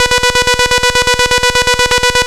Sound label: Pitfall Trem B3, Glitch Toys LoFi; Ableton Foundation FreePacks